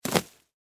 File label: Rope Drop, Rope Drop Dirt Toss; Rodeo; Backgrounds; Foley; Rodeos; Blastwave